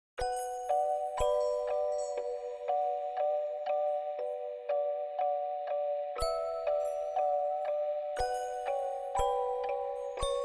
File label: Ending (Only Music)